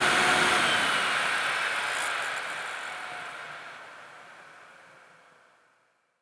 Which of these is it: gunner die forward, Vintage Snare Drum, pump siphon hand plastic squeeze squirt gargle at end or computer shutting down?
computer shutting down